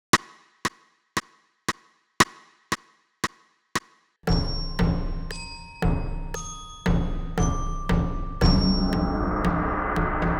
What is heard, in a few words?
with click